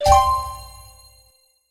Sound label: Skill Active; In Characters Skill Active